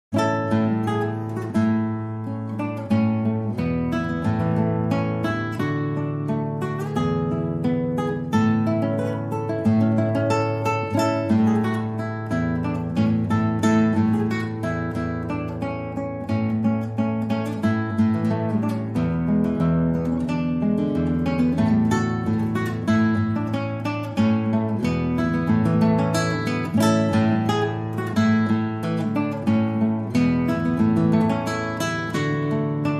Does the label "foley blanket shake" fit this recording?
No